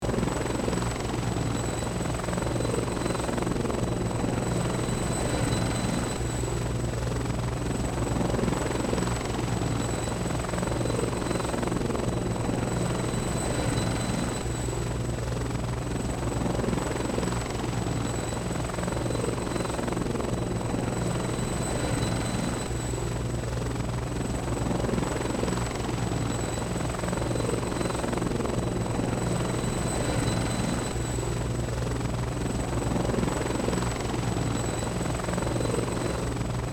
Military helicopter flying forward movement engine loopable